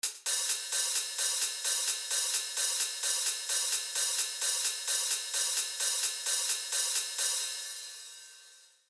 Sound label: hihat sample hi hat only before